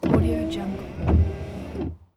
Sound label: car wipers interior single